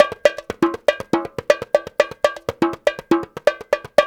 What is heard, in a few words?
120, Coil Conga; Spectrasonics - Supreme Beats - World Dance